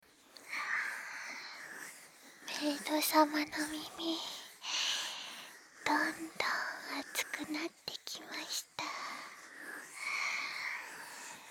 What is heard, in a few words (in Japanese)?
ASMR; メイド自宅派遣